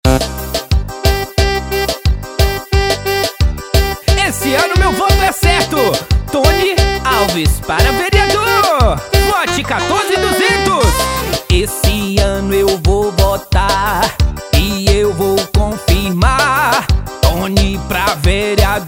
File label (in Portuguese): jingles politicos; eleicao 2020